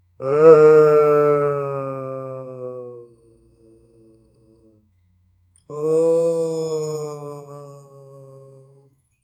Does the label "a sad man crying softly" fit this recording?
Yes